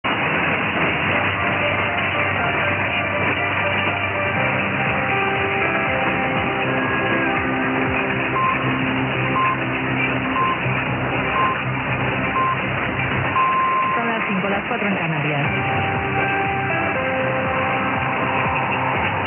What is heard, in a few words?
TOH pips; SER Radio San Sebastian Spain